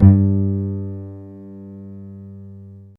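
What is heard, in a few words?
acoustic bass G2; Greytsounds - Scott Peers Sound Engineering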